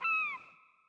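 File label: seagull; El Rey Arturo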